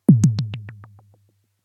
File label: decay, message tones